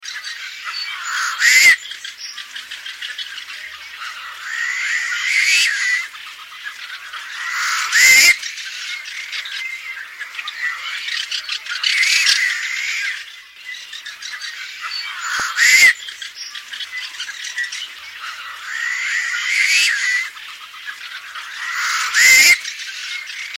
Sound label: Tricolored Blackbird; Kevin J Colver